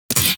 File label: Shotgun Alt Mech; Weapons; Warframe; Tenno; Revenant Shotgun